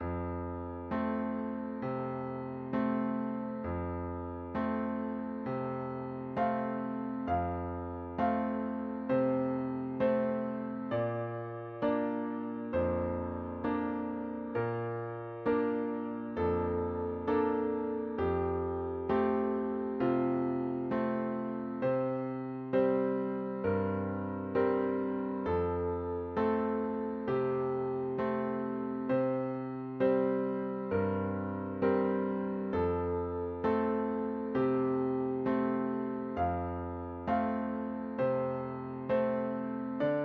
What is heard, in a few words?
twinkle star healing elementary